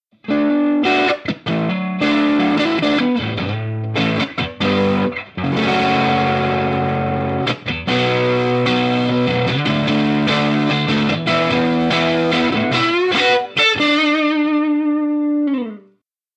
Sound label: overdriven